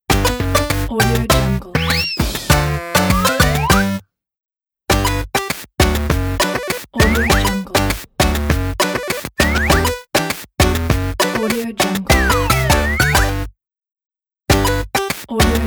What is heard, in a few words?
8-bit funny logo